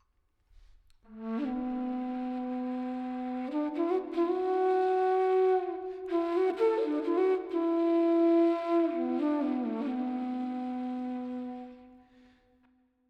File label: Verb 3-4 Bm 128